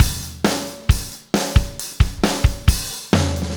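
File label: 134 bpm, beat loops; Zero-G; Monster Beats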